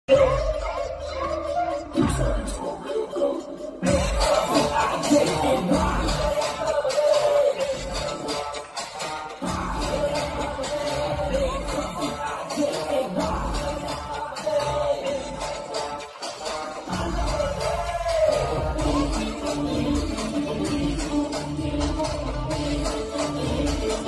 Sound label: intervel bgm; ilaya thalapathy